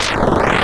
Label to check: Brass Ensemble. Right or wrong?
Wrong